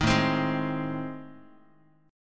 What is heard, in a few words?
A Minor Major 7th Flat 5th, Guitar; strummed; AmM7b5; JGuitar